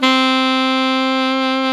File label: SAX ALTO MF, Alto Tube; Roland LCDP07 Super Sax